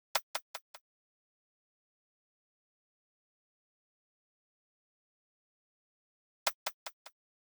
Drum Loop 152 BPM Percussion; Cymatics; Vibes II Drum Loop Collection; For You Drum Loop

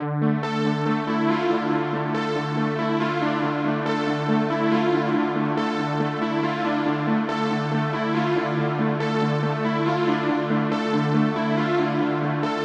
140 BPM D Min Synth, Melody Loops; Synth Collection; Octane; Cymatics; ANALOG EVOLUTION Beta

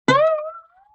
Guitar Plink Slide

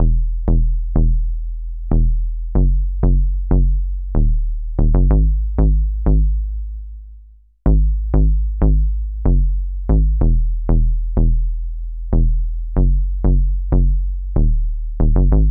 94 BPM G# Min Bass; Melodies; Loop Stems; Cymatics; GHOST (Beta Pack); Freedom

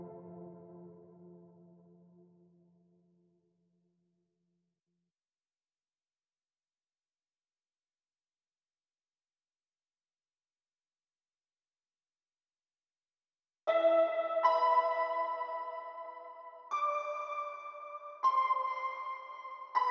79 BPM C Maj Synth; Cymatics; Gems Free Teaser Pack; Cymatics Gems Vol 2; Memories

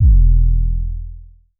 808 E, Basses; Cymatics; Eternity Sample Pack; 808s

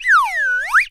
whistle with sliding tone, Cartoon Film Effects

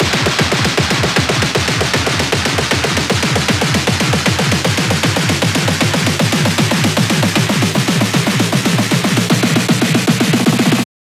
buildup loop 155; GhostHack

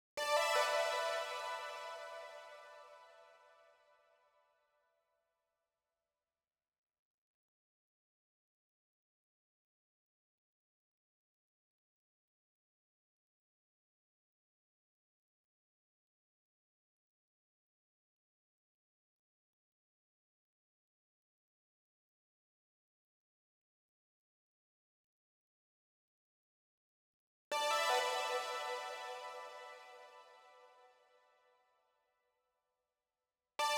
158 BPM B Min Synth; Melody; RnB; Loop Stems; Cymatics; 2022 Melody Collection; Without U

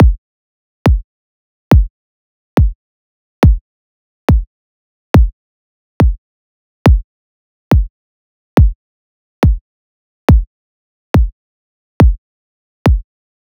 Dubstep Drum Loop Kick, 150bpm; Stem Seperated

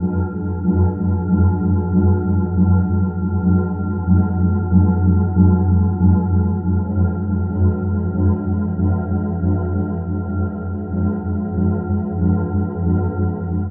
Pad F Drone (140); Trap and Dubstep

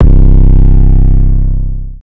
808 Burned (C); Cymatics; GHOST (Beta Pack)

